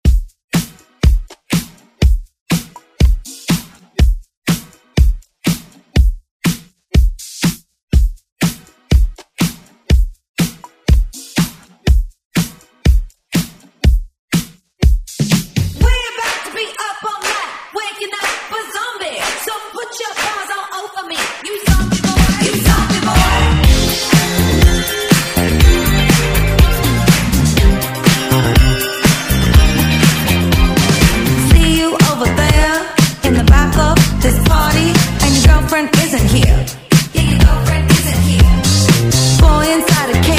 Top 40; Redrum

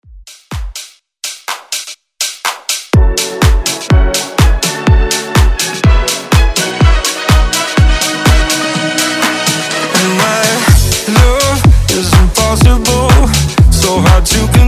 House Redrum Intro

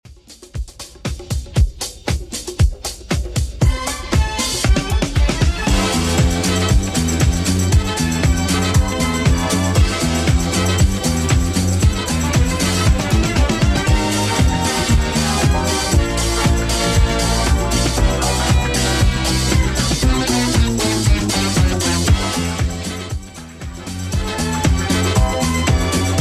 70s Redrum 117bpm CLEAN

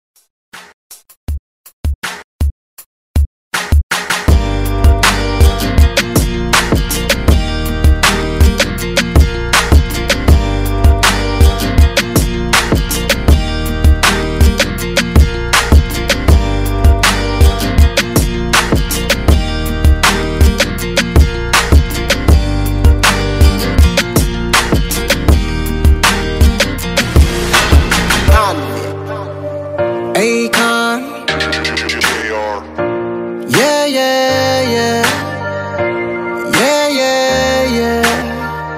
Top40

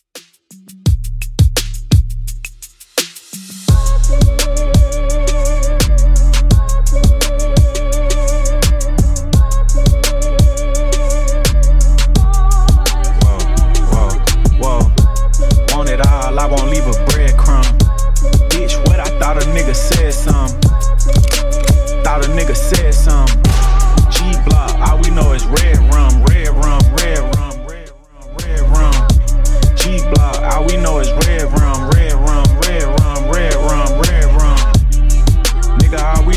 Hiphop Redrum 85bpm

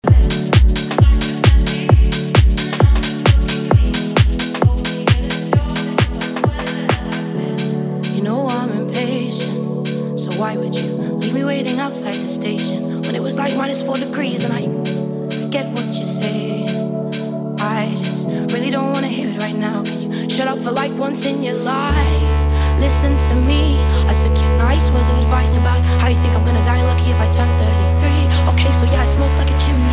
Club Party Banger

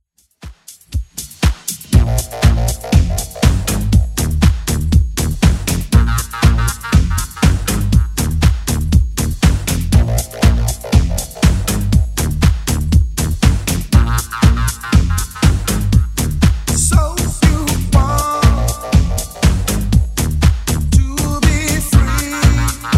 90s Redrum 120bpm

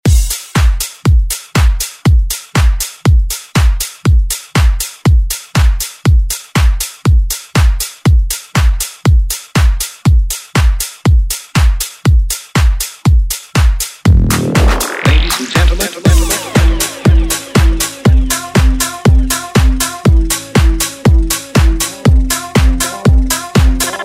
Dance Redrum

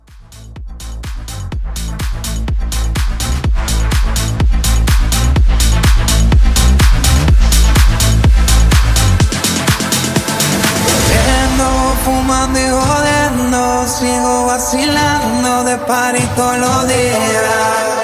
Latin Hybrid

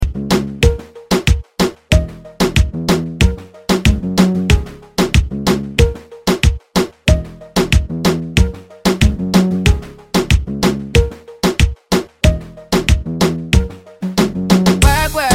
Reggeaton

